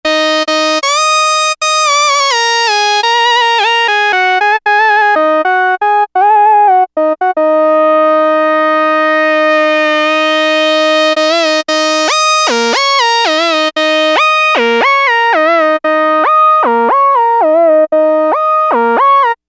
Arc Lead

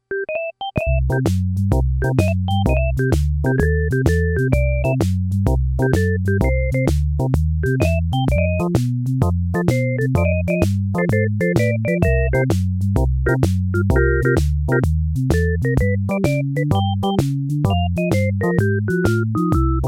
Casiotone 701

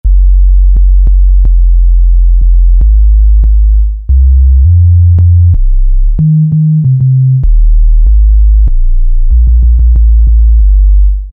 Sub Bass, Korg MS2000; Factory Patches

